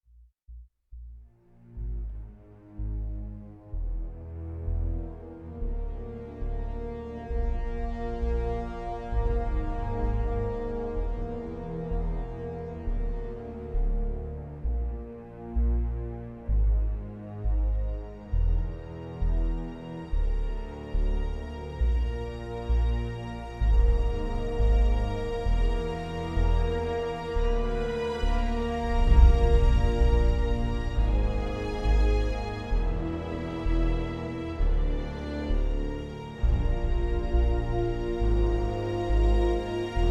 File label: bagpipe overlay